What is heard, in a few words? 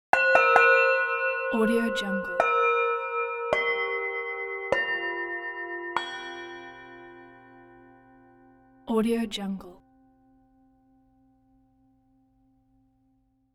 tibetan singing bowl